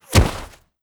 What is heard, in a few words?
bullet impact snow; Rust